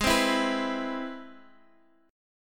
C Augmented Major 7th Ab; C+M7/Ab strummed; JGuitar

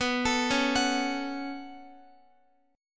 B 7th Suspended 2nd B, Guitar; B7sus2; arpeggiated; x,x,11,14,13,14; 4F,4A,5C,5G,5C,6E

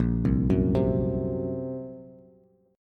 A sharp Suspended 2nd Sharp 5th A sharp, Bass; A#sus2#5 arpeggiated; JGuitar

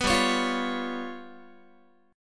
G 7th Flat 5th, Guitar; G7b5 strummed

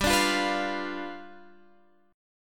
G 7th G down Guitar Standard; strummed; capo=3

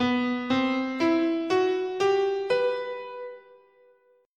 B Japanese, scale

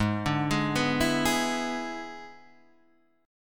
E 7th G#, guitar

